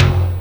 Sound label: PERC, Dance Mega Drums; Best Service; PERC-MIX